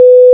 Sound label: tone